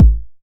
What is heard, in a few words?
909 BD; Goldbaby Tape808 & Tape909; The Tape 909